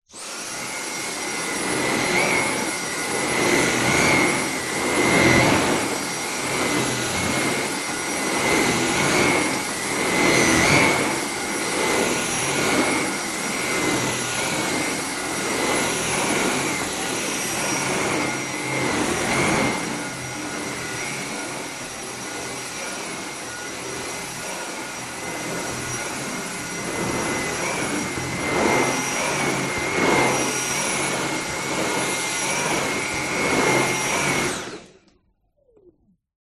household vacuum cleaner dyson v7 stick vacuum up and around on carpet